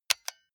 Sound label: console controller xbox rt press fast